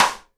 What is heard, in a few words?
SIDE STICK, Live Snares